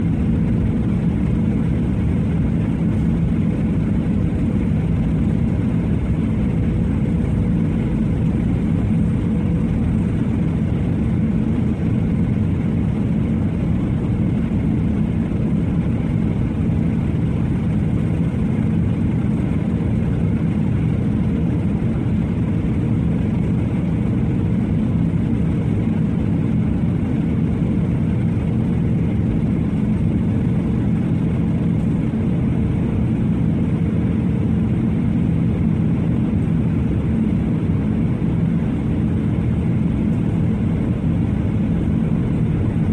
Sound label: airplane engine